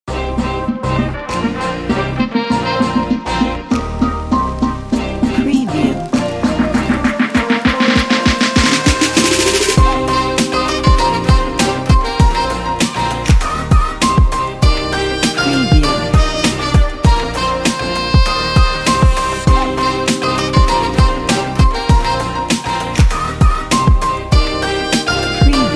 for hip hop

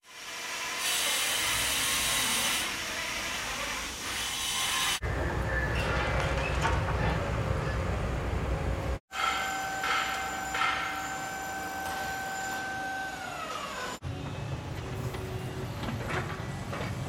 Ambience Construction Sites; Just Ambience; SoundBits

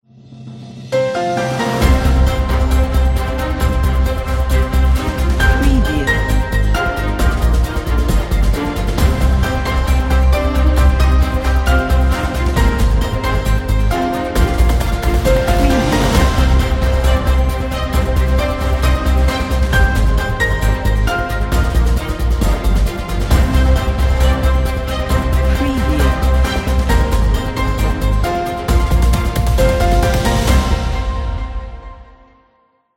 breaking news

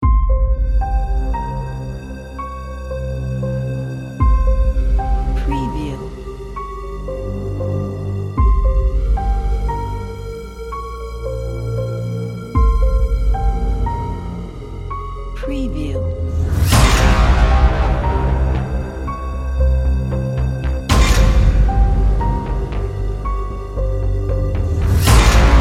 epic

